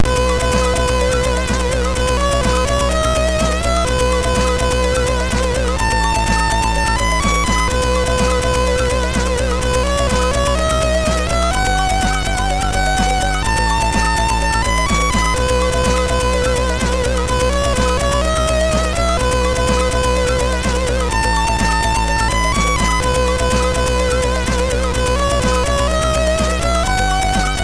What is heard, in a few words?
Short Intro, MOS6581R3